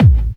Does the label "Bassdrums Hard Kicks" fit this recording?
Yes